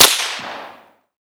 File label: snap